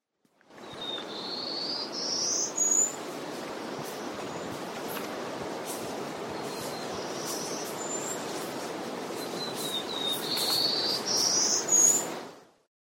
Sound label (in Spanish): Canto del ave misteriosa